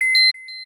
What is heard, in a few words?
menuback